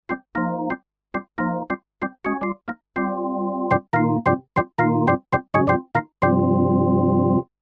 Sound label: Roland S-330 B3